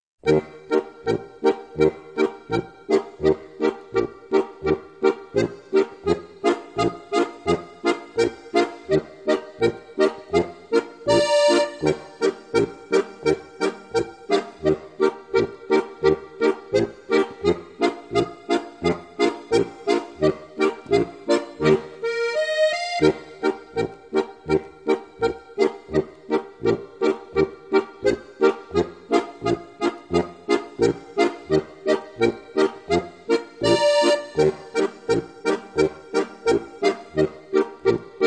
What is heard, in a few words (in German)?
amboss polka; 30 Volkstümliche Schlager-Hits, Folge 2